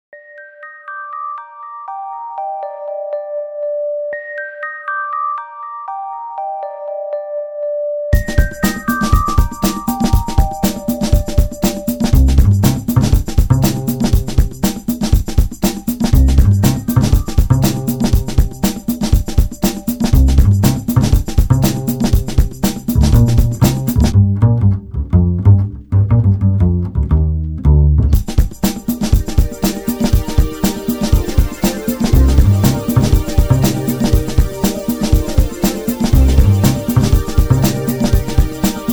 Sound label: first step in 120 bpm